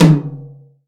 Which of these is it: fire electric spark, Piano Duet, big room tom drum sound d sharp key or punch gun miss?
big room tom drum sound d sharp key